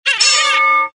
squeaky bell

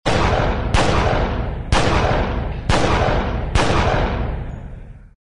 weapons guns 9 mm shots

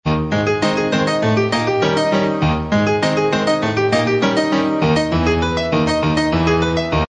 maple leaf rag

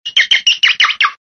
birds falcon chirps

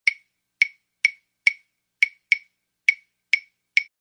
rhythm latin claves

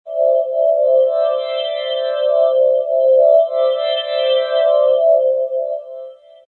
magic shimmer tone; fantasy

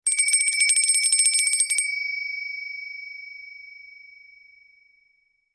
Crystal Chime Alert Tone

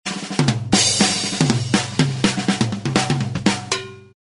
accent long drum roll tumble

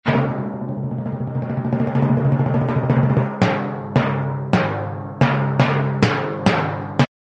timpani drum roll fanfare

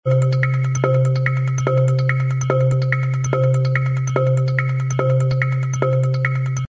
rhythm latin marimba

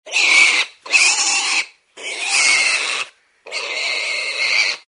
animals piglet squeals

